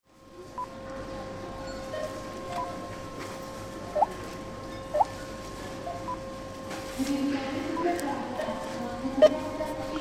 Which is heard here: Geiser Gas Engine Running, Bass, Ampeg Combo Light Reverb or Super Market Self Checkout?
Super Market Self Checkout